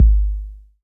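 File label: bass drum 808 Tape Decay D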